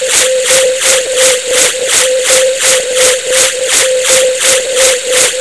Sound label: liquid